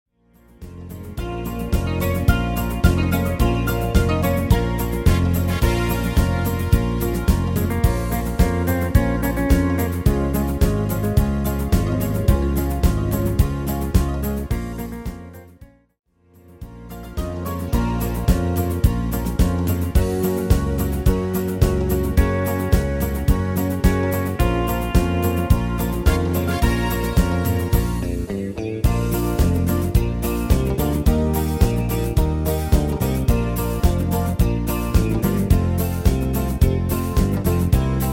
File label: Country